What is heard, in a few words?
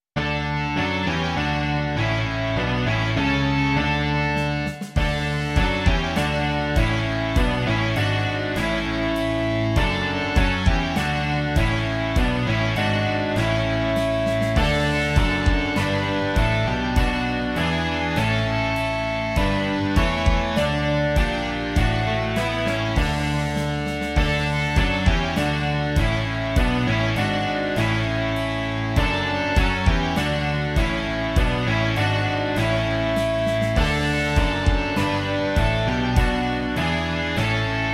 rock